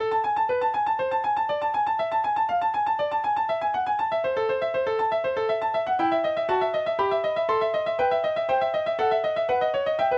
canzonetta a minor pour piano; buxtehude dieterich; buxwv 225

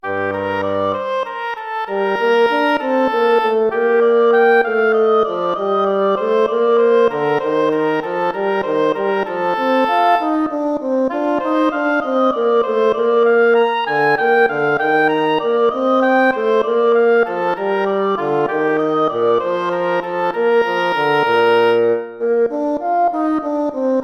gigue

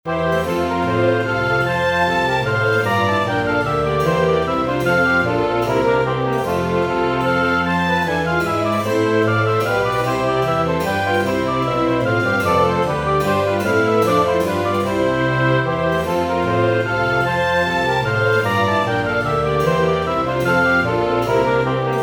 baroque suite for string orchestra passpied

